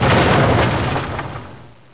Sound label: explo; Caesar3